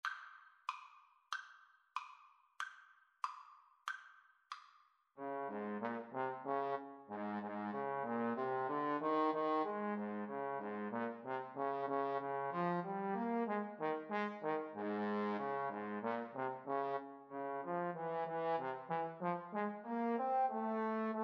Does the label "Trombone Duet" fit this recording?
Yes